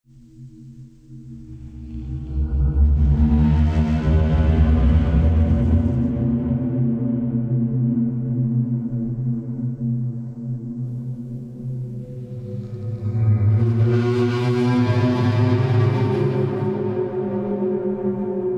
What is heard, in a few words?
Hollow Scream Creepy Ambience; dark ambient music